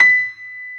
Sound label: yamaha grand piano